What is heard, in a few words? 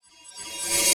Hissing Approach